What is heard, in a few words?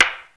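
Wood crack, Roland JD-990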